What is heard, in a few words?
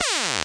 laser powered pea shooter; UNUSUAL KITS and FX; Atomhard Electro FX